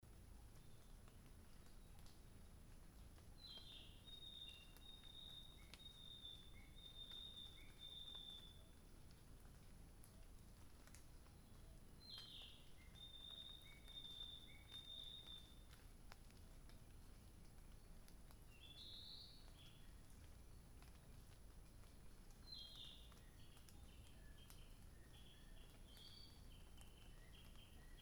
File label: after the rain